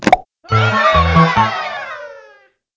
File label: golden egg